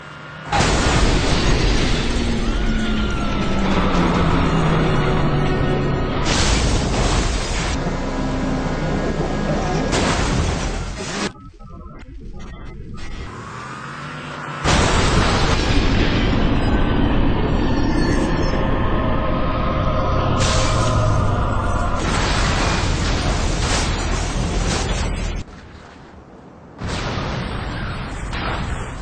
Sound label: Crash Death Sound